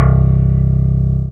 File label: Low Tuned E Bass